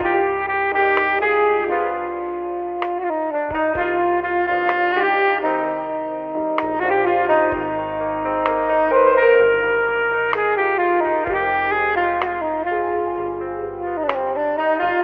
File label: sax sad landing; Loops